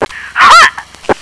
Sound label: Knife kill; MultiKill